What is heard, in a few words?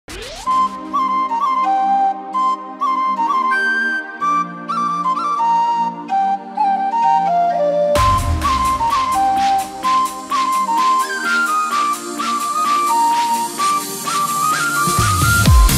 flute